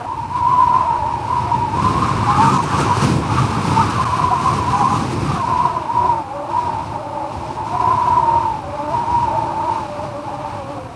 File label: windmill wind loop; canals; hl2